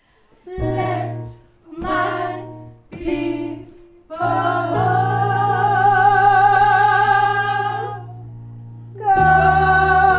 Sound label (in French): fin alto; gospel